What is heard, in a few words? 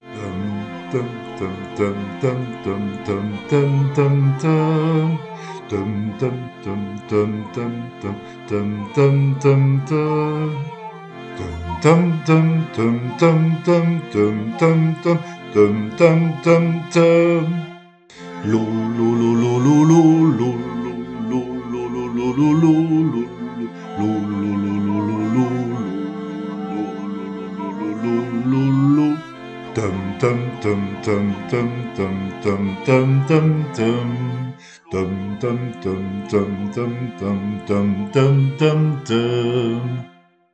Les 4 Saisons Automne Alto; Alti